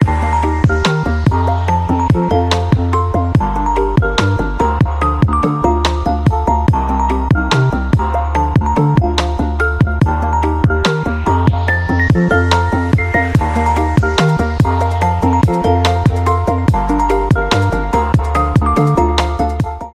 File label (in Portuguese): INSTITUCIONAL; pack de tilhas e efeitos 2.0